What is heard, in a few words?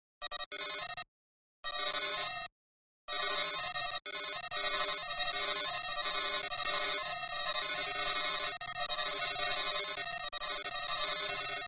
bugs filt; RTcmix FMINST. instrument